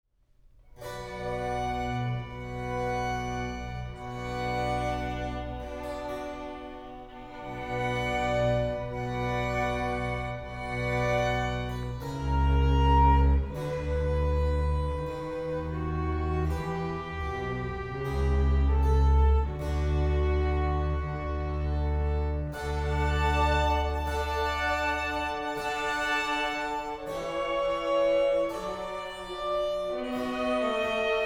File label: lamento adagio; battalia